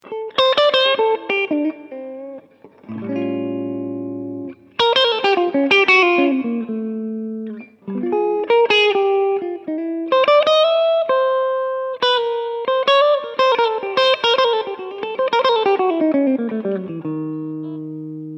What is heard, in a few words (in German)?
steg hals clean